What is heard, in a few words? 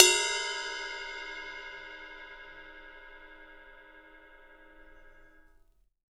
RIDE20HV BEL; Big Fish Audio; Ross Garfield Drums VOL-2; CYMBALS